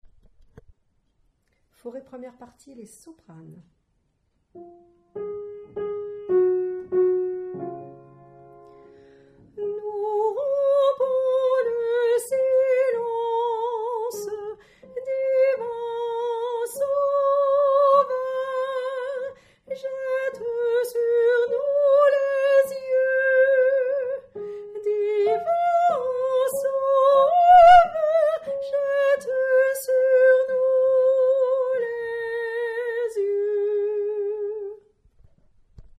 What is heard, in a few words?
Soprano